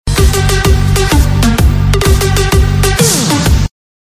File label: electro club notification